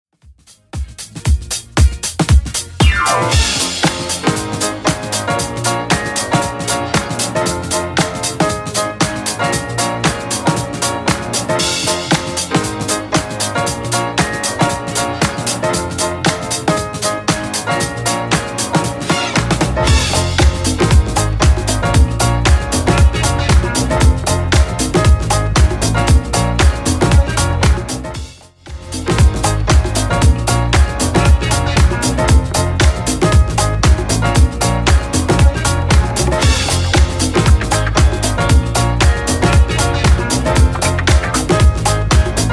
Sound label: 70s Dance Redrum 116bpm